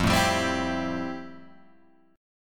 F Major 9th F, Guitar, Open G

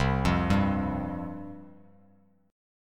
C Suspended 4th C, chord; Csus4 arpeggiated; JGuitar

